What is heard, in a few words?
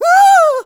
halloween scream